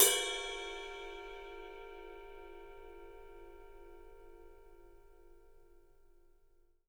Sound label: Studio Ride; E-MU Producer Series Vol. 5 – 3-D Audio Collection; 3D Percussives